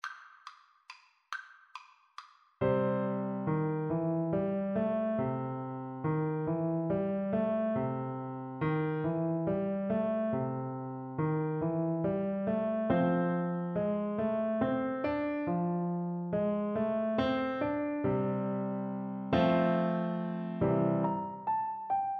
Oboe

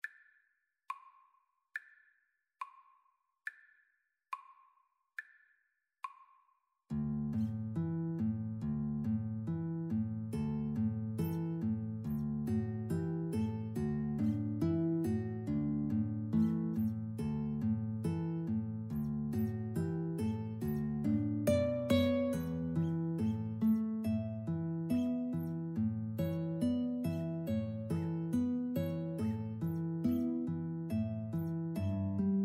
Guitar Trio

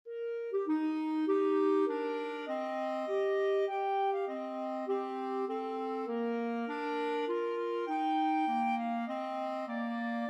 clarinet duet

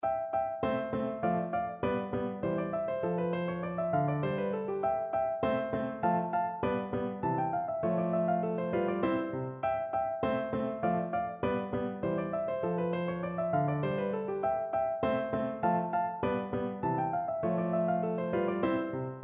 sonata 16 3rd mvt k545, piano

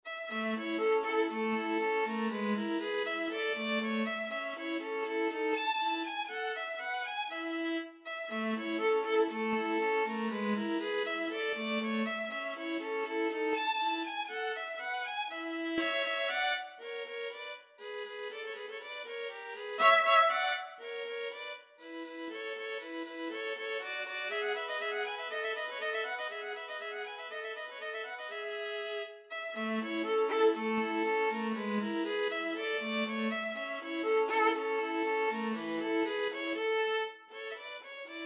violin viola duet

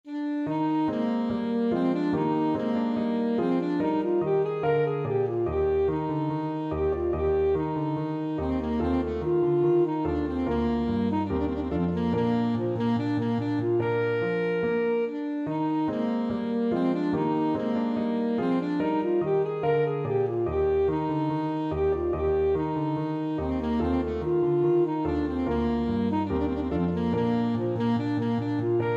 march in d ASAX, saxophone

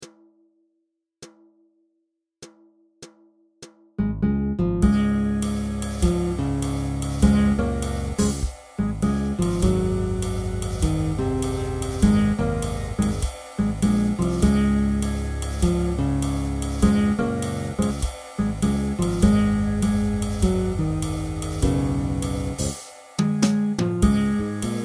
whole world TPT, trumpet

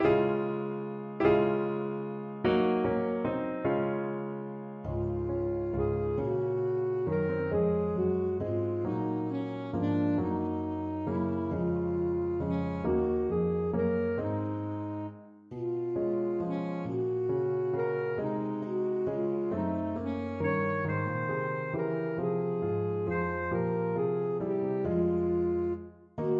shepherds, saxophone

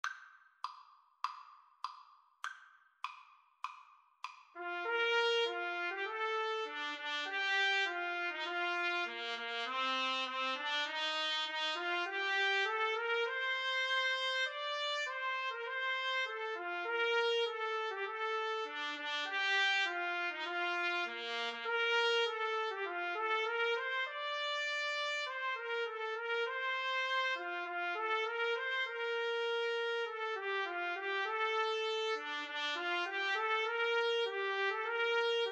trumpet duet

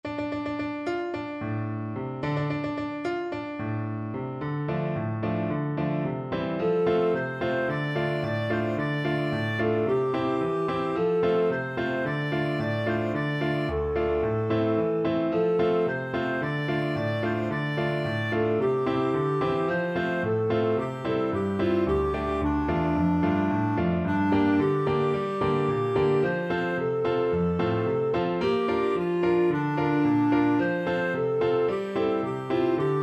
kangding, clarinet

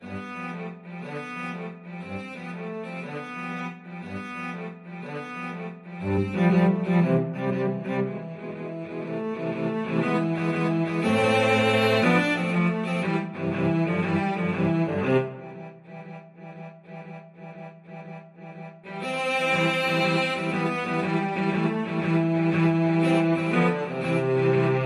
funiculi, cello trio

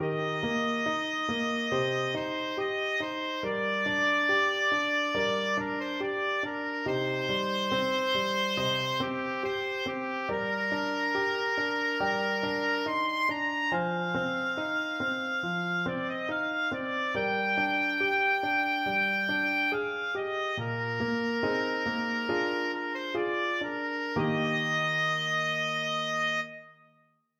puccini butterfly, oboe

